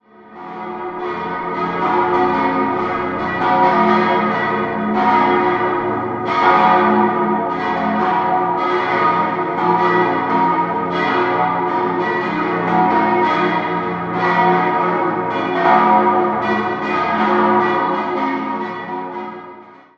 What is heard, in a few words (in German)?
Muenchen Mariahilf, Glocken